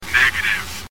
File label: Negative